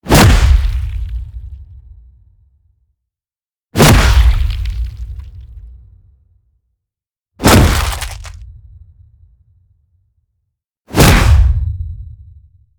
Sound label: hit; finish him